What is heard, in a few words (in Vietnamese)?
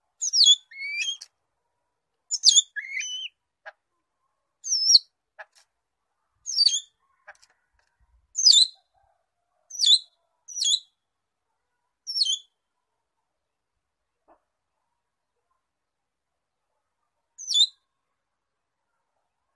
Tieng Phuong Hoang Dat; goibay